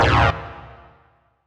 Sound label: Stab C2, Club Techno; Zero-G; Stabs